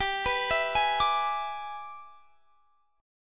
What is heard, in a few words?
E 7th G, guitar; E7/G; arpeggiated